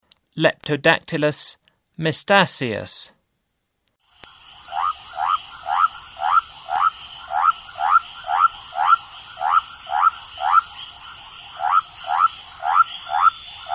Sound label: Leptodactylus mystaceus, Frogs of Ecuadorian Amazon; S. America